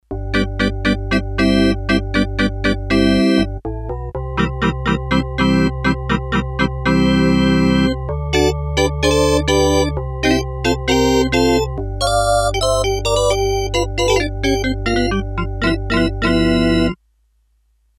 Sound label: Organ, Korg DS-8; Factory Patches